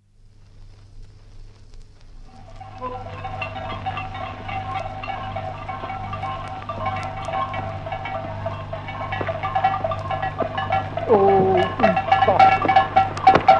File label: Reindeer Ride, with bells, orders shouted & dogs barking; Holiday; BBC